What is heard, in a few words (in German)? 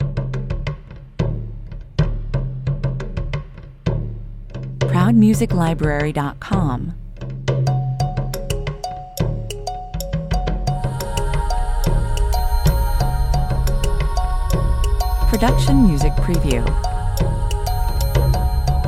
Abenteuer-Urlaub im Regenwald, atmosphärisch reichhaltig wie die Fauna des Amazonas, 90 bpm